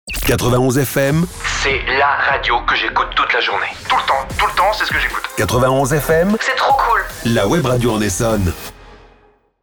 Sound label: Sweep ID; 91 FM; Essonne